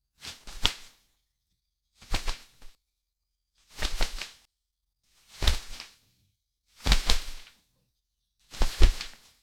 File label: curtain rush velvet foldi